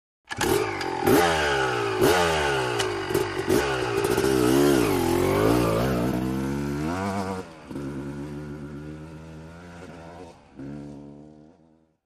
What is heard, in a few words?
Motorcycle Motocross; Start / Revs / Away 250 Cc Honda, Starts Up, Revs And Drives Away, Shot Cu.